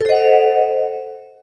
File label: surprise